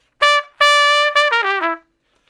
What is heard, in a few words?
Trumpet Shuffle (E); Solos